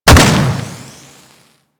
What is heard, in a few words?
Pulse Exp Impact Default; Warframe